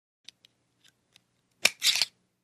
Safety Belt Open, seat belts; accessories

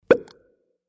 Bubble Pop Low Cheek; bubbles

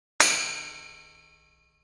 Impact Vari Metal, crashes

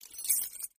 keys jingle

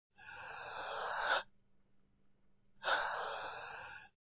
Deep Inhale Labored, wheezing

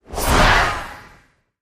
Animal Sting Growl; stingers & whooshes

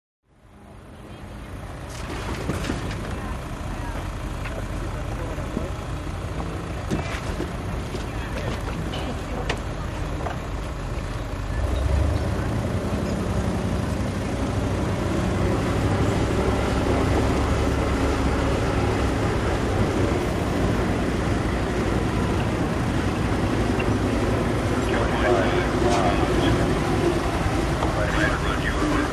Boat Race Pit Doc Amb, motorboats

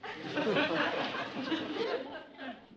Crowd Lt Burst, small int

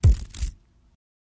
Impact With Chain Movement, Single; chains

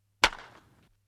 Baseball Bat Wood Hi; baseball fx & games

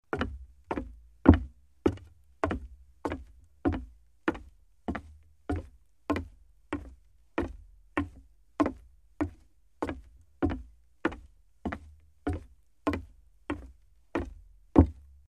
Footsteps Hollow Woo

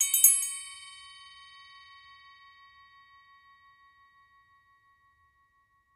Elephant Bell, bells

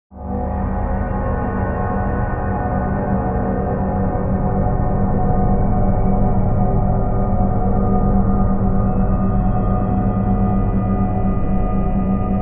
Bed Foreboding Chora

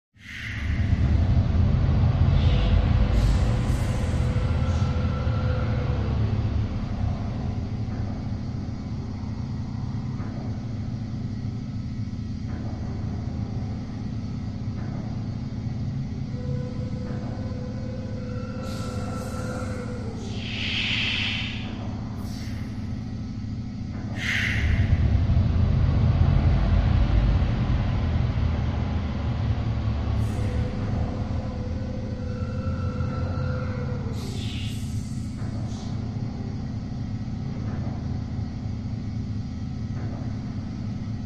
Space Factory Ambie; Space Factory Ambience

